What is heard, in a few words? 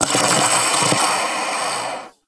Armadillo Vocal Snore; Warframe; Characters; Hunting Critters